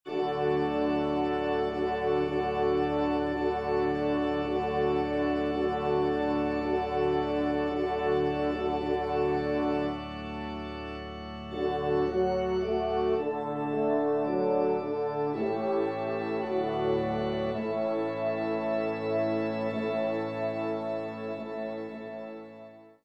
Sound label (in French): Trp Org; messe cantin